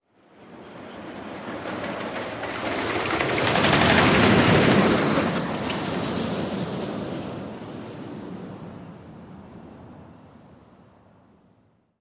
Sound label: Roller Coaster Fast